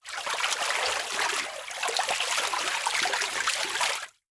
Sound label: Liquid Water Water Plastic Tube Swirl In Water